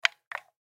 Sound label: Guitar Pedal, Guitar Pedal Turn On; Guitar Sound Effects; Blastwave